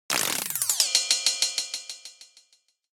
twirl; message tones

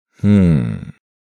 man hmmm

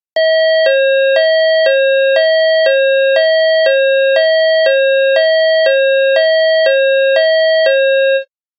siren hi lo; police ringtone

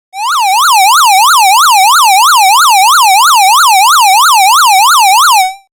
siren yelp; ringtone; alarm sounds alerts ringtones

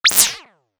disobedient; sound effects

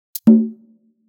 task completed, message ringtone; message tones

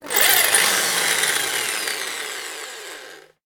power drill starting and stopping; everyday objects

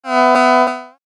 will you; notification sounds

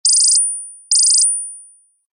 wake up tones; oringz pack nine

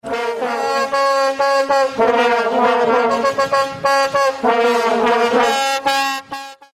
rhythm of the vuvuzela; ringtone

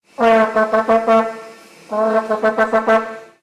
single, annoying vuvuzela tone

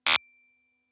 glitch in the matrix; message tones

